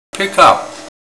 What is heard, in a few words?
pick up